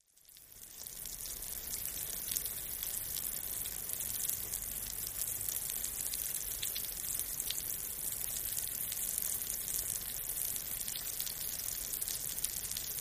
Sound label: Rain Fall On Concrete